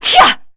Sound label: Attack, Vox; en; Shamilla; Kings Raid